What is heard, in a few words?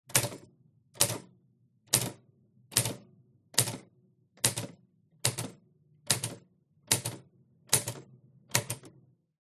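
typewriter 1960 key presses; Smith & Corona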